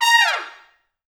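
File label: Pop Brass, F#4; Best Service; ProSamples vol.19; AKAI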